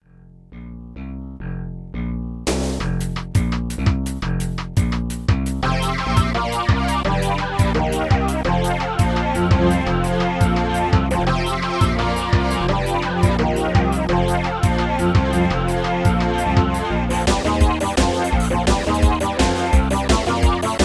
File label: menu jp; Sidekicks